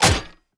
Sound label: Inspect Close; Valorant; odin